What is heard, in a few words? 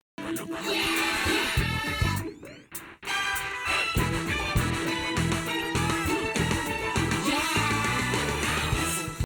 chewing chewing